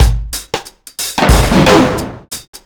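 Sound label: reggae drum FX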